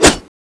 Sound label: slash hit idle, weapons; cstrike